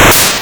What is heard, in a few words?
Hard PCM Chip; chiptune